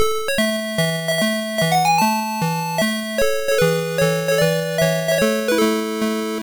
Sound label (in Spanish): Himno Nacional Mexicano; World Games; Master System